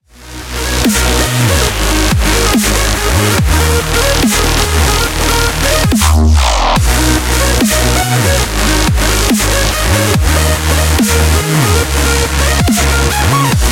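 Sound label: background music crossfade; Invaxion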